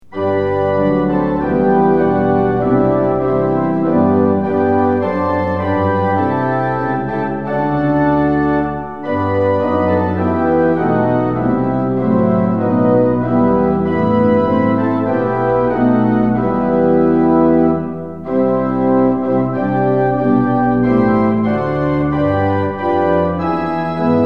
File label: tune; hymns; lillenas